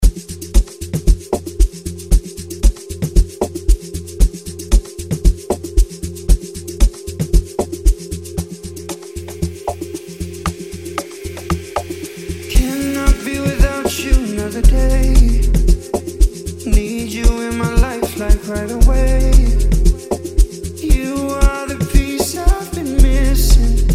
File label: Church Bells; Music Mi Casa